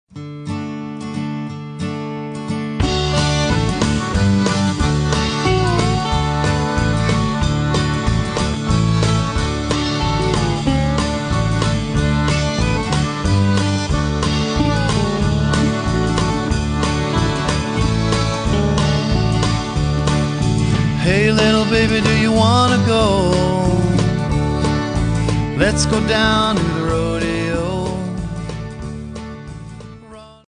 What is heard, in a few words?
Accordian Drive